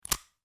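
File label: unlock, iphone os sounds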